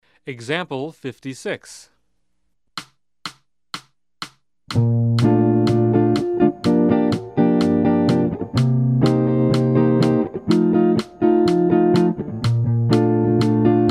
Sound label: Jazz